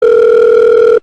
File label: Ring; elevenlabs